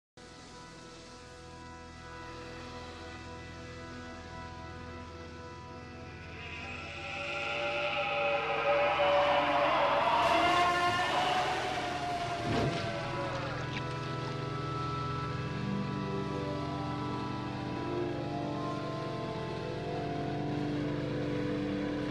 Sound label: scream